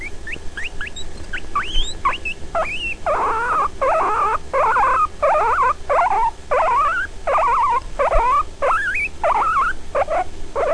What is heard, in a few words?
hurt, cavia